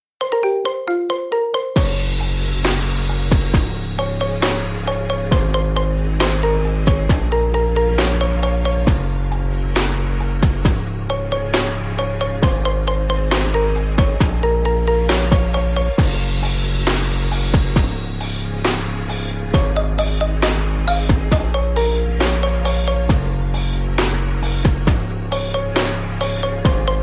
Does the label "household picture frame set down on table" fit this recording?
No